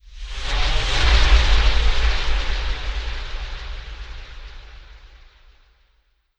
blowout flare

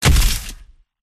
silverback impact; gow

